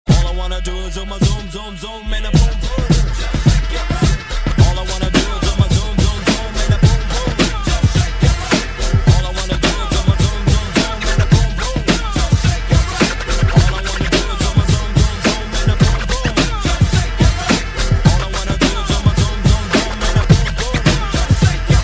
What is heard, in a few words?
Shaker